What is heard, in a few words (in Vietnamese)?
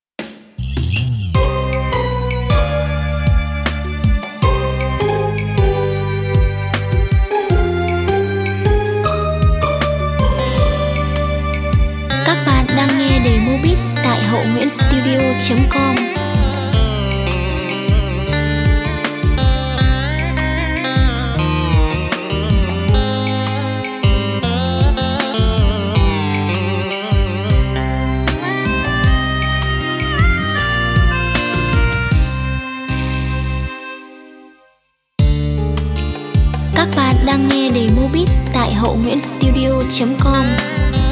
Chuyen hop tan beat